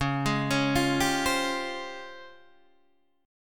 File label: C sharp Major Sharp 11th, Guitar Standard; arpeggiated; capo=8